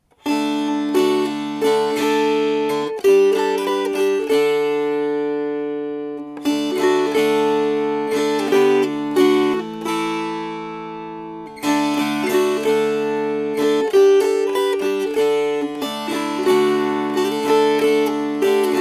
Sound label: Strummed